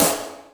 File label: BRIGHT SNAR; M1-M3R KITS; Greytsounds VOL-1